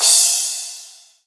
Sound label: Crash, Drums Cymbal